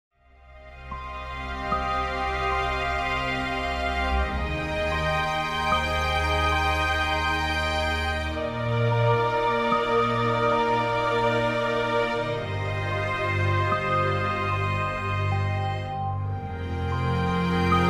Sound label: Ethereal Spaces strings bells cello; Variation